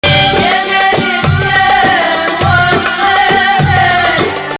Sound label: Rast Ya Maal 2nd Rast; Syrian song repertory